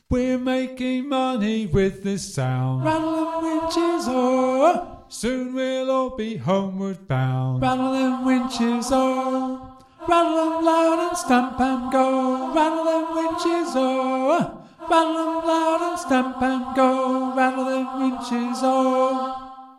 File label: Rattle Them Winches - Alto; eccleshall singathon